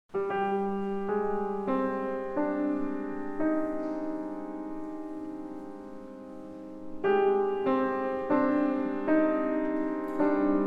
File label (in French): La Cloche Consolante